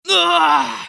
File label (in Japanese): ダウン; グルトルデ